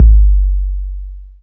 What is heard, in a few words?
phat steel kick drum sample g key